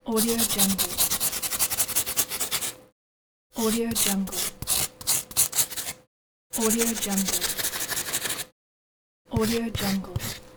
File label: sketching